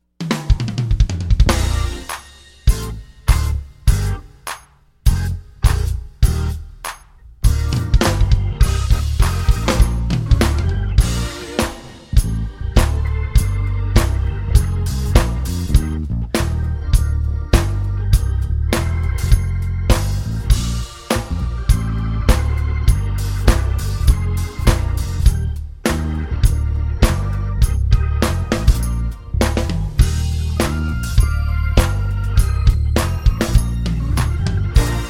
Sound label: piano play along instrumental accompaniment